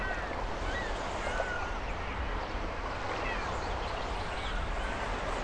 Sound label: ambi door; Mafie 1